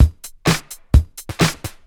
Housebeats, 128BPM; Vengeance Samples; VEH2 Loops

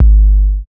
Bass Drop, 808; Street Anthems Sound Kit

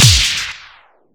Impacts

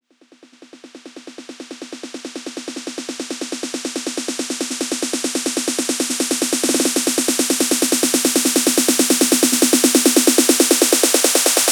140BPM SNARE 8 Bars; Vengeance Samples; Snares&FX Pairs

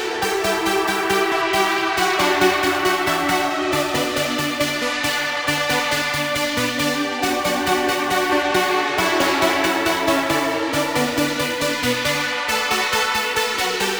Special Sounds Root E 137 BPM; vengeance essential club sounds-3

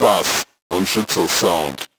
Vocal Phrases; Vengeance Samples; Vocals

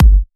Kicks; Vengeance Samples

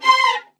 Synth Shots; Vengeance Samples

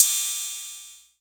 Ride, Cymbals

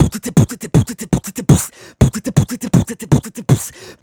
Beatbox Loops, Human Beatbox

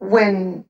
LoFi Vocals Oneshots; Vengeance Samples

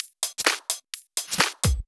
Houseloops 128BPM; Vengeance Samples

